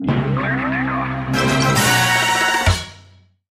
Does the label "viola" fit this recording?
No